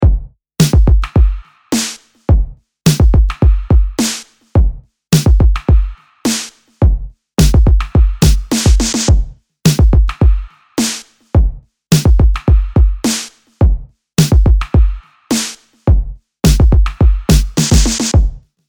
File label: Drums